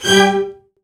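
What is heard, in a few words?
String Section; CMI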